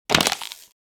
Crushing a plastic bottle before throwing it in the trash